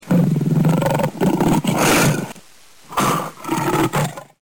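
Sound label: stereo growl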